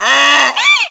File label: donkey hee haw